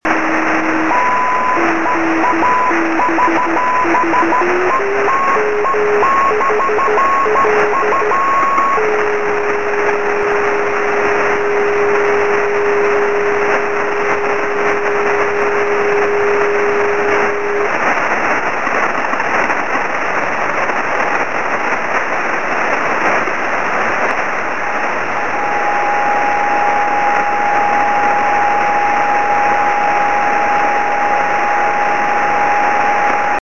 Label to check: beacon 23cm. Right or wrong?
Right